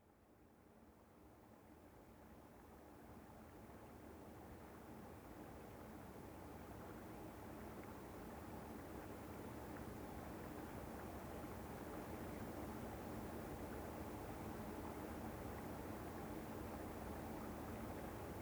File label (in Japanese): ASMR 「綿棒+オイル耳かき（右耳）」（クシュクシュ綿棒+ナチュラルベビーオイルでの右耳耳かき）; かかあの綿棒+オイル耳かき（右耳）